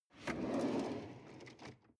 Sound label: drawer medium open draw